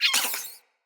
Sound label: Vocal Gross, Animals; Player; Vocals; Floppet Player